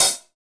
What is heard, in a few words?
HH 1O1 L, DRUMKIT